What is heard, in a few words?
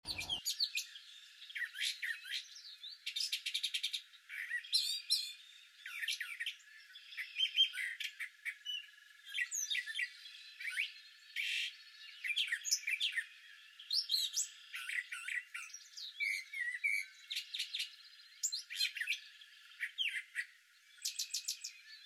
song, birdsong